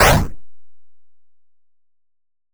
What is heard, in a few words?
Chest; Gloom